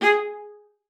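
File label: G#5, viola spiccato; Xsample VOL-5; 90 s Sample CDs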